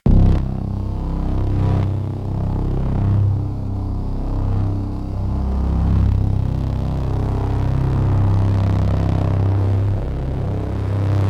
despair dystopia for heavy discomfort; psycho horror

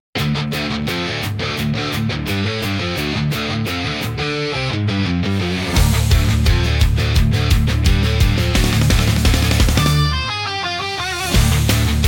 Blade Over Thunder, intense japanese rock

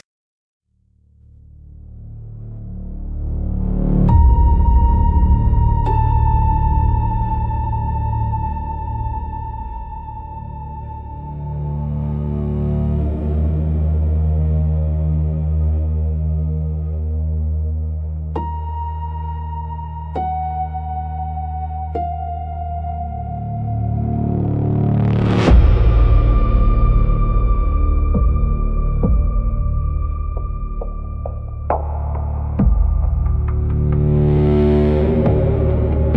spiritual spooky soundscapes

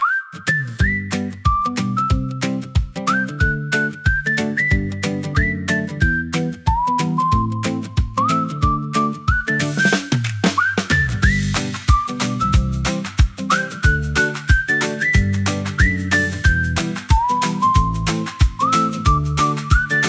Sunrise Spark Run, hand wraps whistles

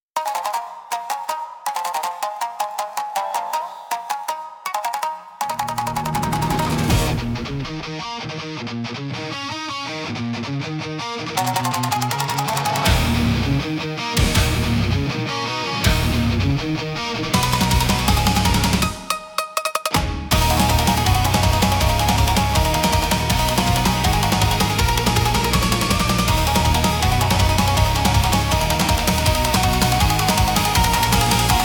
intense japanese rock

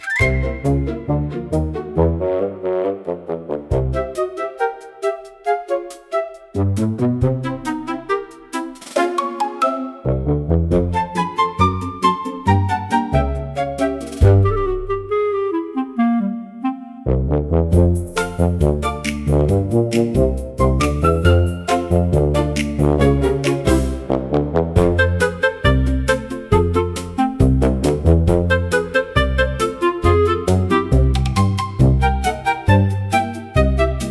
Awkward Tuba Triumph, Comical Orchestra

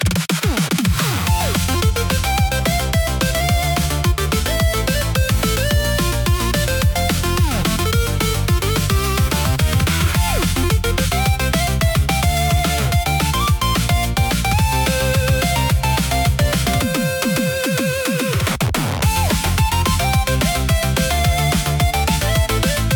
Pixel Party Power-Up, retro game chip tune; pico pico sound

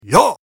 "YAH", samurai voice; samurai voice selection 2

